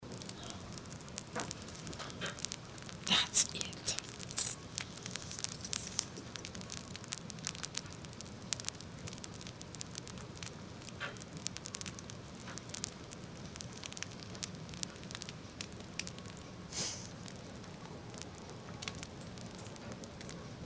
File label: cracking